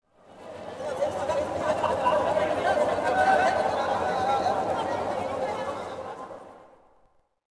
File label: City Crowd; Ambient; Sid Meier's Civilization 4 Complete; Beyond the Sword